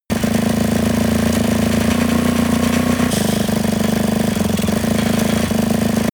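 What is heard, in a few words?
snowmobile engine unsteady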